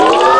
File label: Sound up; Funhouse of Love